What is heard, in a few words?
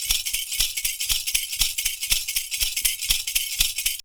PERCU; Univers Sons; Raricussions